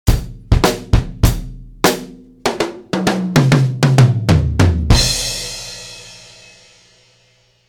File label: Drum 7